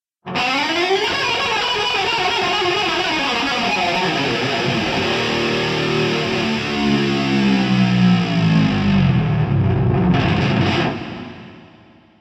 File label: lick; brutal legend